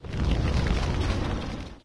nature empty recycle bin; windows 9x; sound schemes

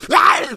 hunter shred, attack voice

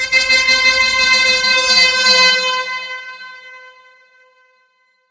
horn air; source engine; tf; passtime; video games

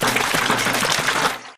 throwable inspect shake; tf; breadmonster

